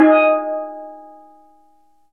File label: steel drum